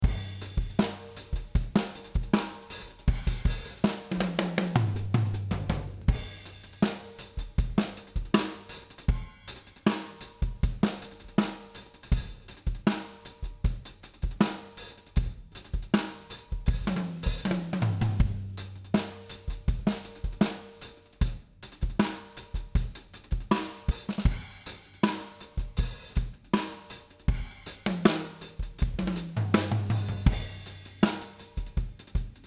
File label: drums